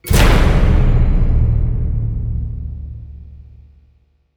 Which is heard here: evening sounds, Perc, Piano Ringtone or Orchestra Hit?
Orchestra Hit